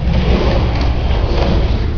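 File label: skateboard; TRANSPOR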